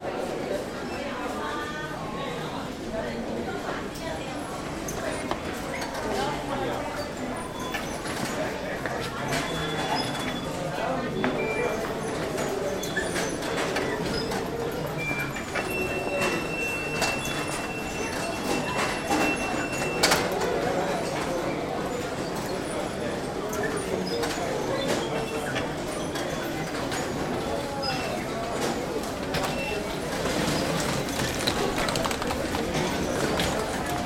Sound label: ambience london underground station by ticket barriers